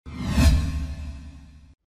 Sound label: woosh